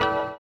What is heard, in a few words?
orient, bizarre guitar; Spectrasonics